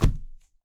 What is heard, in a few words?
Coconut Impact